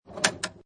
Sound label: Pinball Sound Ball Roll And Passing Object; Video Game Sound Effects